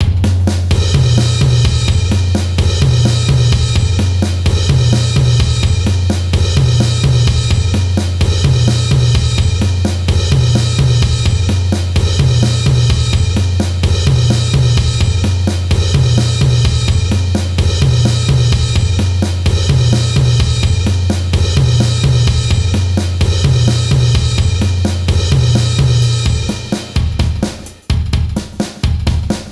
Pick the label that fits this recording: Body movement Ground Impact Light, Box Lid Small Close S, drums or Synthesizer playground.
drums